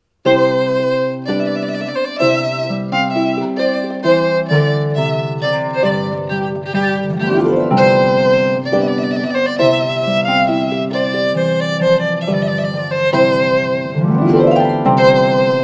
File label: Trumpet Tune